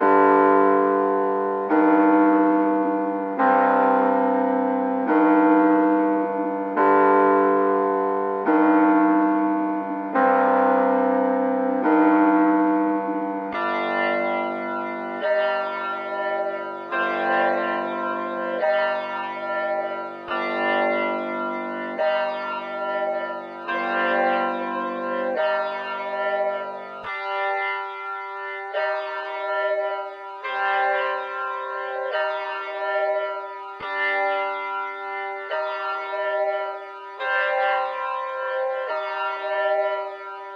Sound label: 142 BPM G Min Piano, Drill; Melody; Loop Stems; Cymatics; 2022 Melody Collection; Pagan